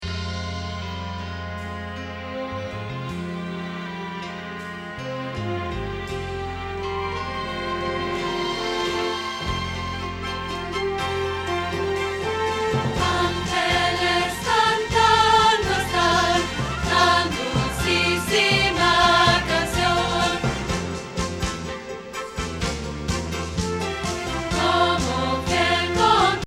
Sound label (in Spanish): Contralto